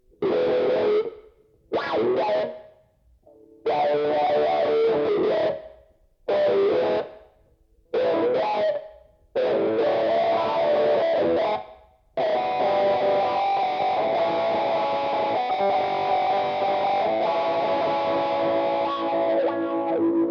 electric; MegaEpix

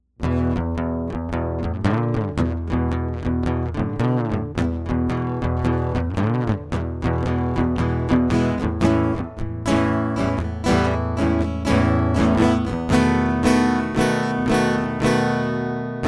guitar; Sure Thing

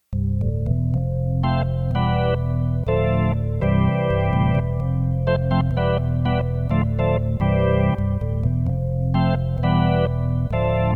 organ; MegaEpix

